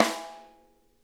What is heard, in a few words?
rim mf L, snare